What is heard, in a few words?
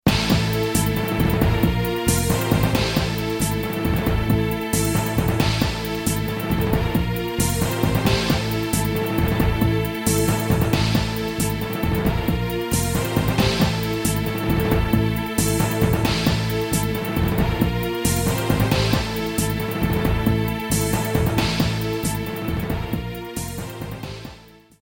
music victory; thanos